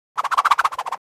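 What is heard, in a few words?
quickly reeling in; Смешные и комичные звуковые эффекты для детских видео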